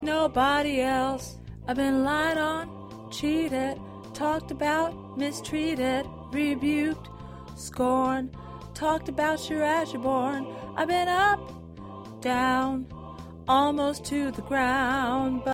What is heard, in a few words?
2nd Tenor, choir; Long As I Got King Jesus